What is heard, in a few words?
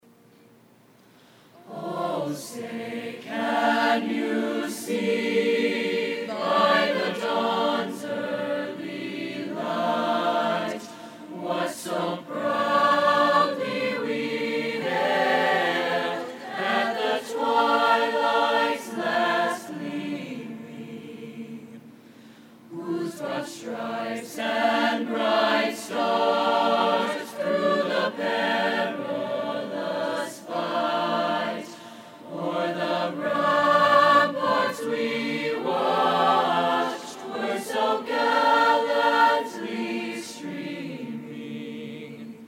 Chorus National Anthem